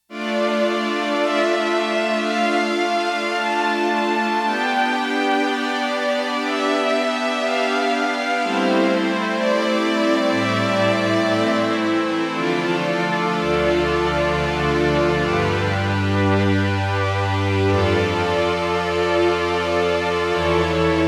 SYN STRINGS, Roland JX-1; Factory presets

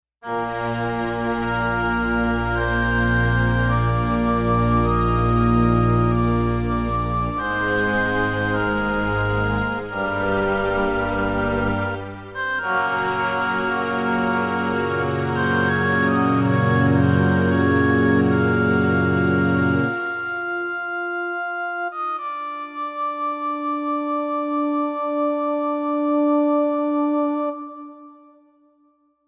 ORCH OBOE; Digital Synthesizer

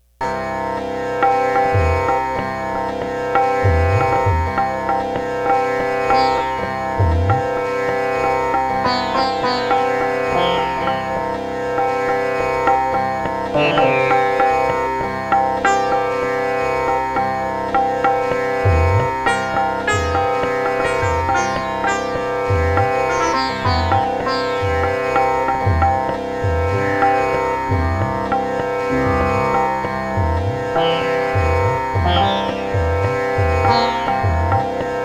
tabla bayan drums sitar tambura

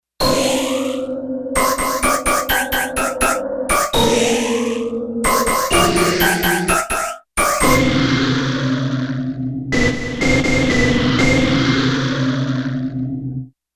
Gated FX

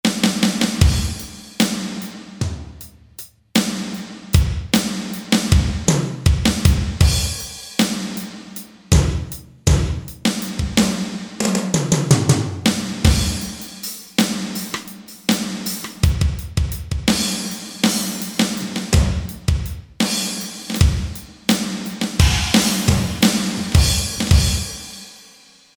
Reverb Kit; Roland L-CDX-01 Rhythm Section Instruments

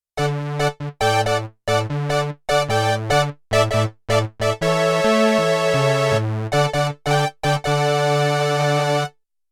Double Basic Prophet, Prophet 5; Yamaha TX16W

